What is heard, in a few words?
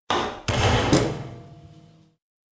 break metal